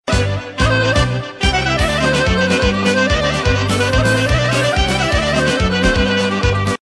Rachenica

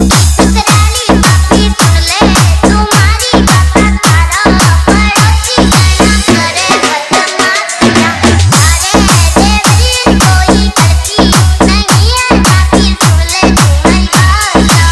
rajasthani ringtone

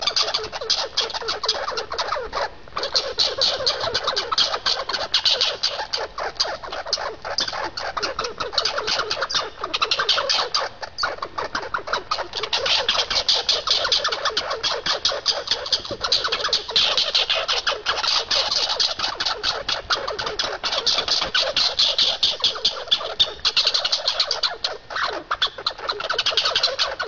Squirrel

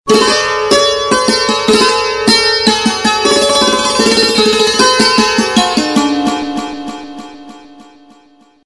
Santoor Sms Tone

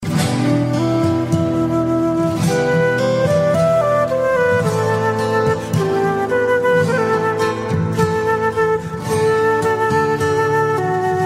Flute Song

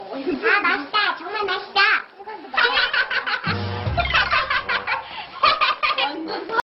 Laugh Helium

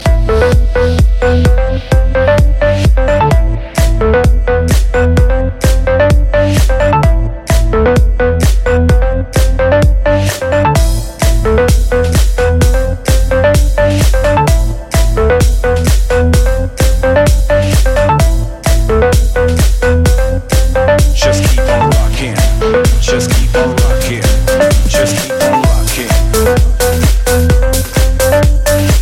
Easy Electro

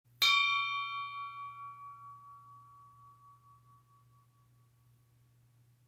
Pleasant Ding

